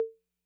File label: Congas Hi Max Decay, 808